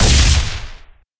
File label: Sci-Fi Weapons-Sniper Rifle Single Shot